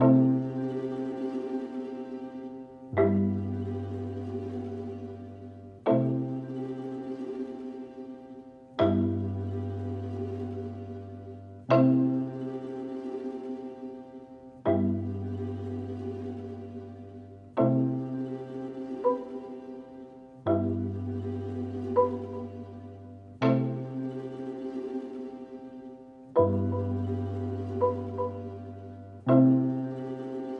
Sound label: Music Level Argument Loop